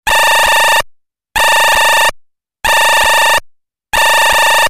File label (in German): turteltaube, Vögel; midi; Polyfonisk musik; siemens